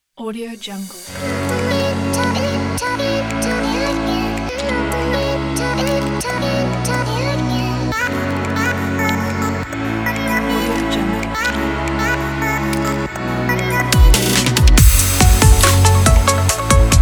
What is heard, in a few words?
corporate technology; eva-kissmusic